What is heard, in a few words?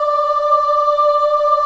D4 child ah, choir; USB Soundscan vol.28